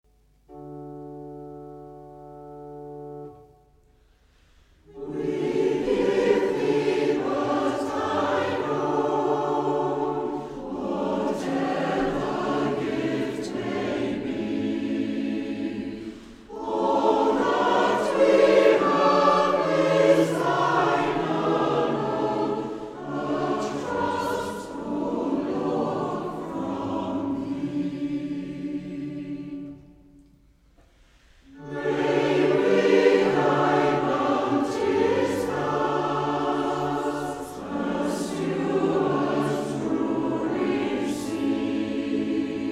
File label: Choir, hymns